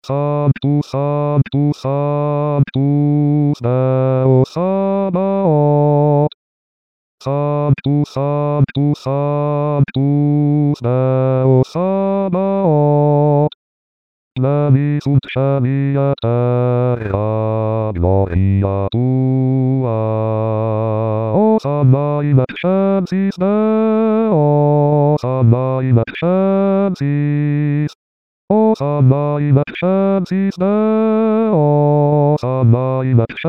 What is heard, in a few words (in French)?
Sanctus, Chorale